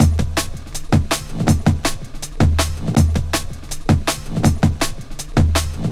162, Jungle Breaks; Explore; Jungle Warfare 1&2

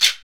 SHKR, Afro; Roland LCDP14 Africa VOL-2; Afro Menu 3

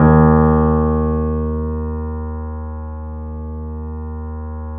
D#, The Piano; InVision Interactive - The Piano; BOESINGSOFT

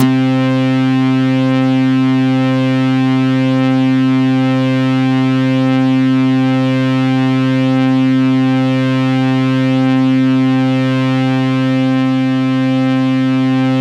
C3, Synth Line; 90 s Sample CDs; NiceBeats; SynthLine VOL-14

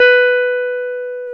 Jazz Guitar

